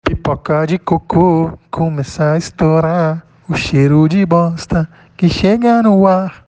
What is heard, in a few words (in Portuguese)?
jingle pipoca de coco